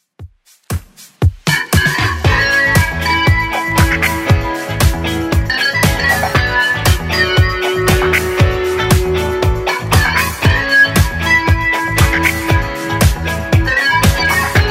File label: 70s Redrum 117bpm